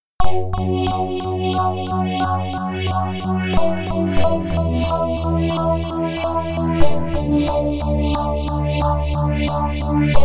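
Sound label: sequence new age delay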